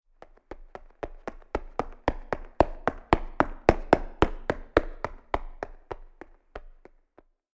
足音

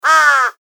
カラス

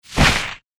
振り下ろす一撃

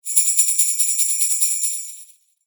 ジングルベル シャカシャカ 響きなし

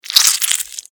クリーチャーが食べる音

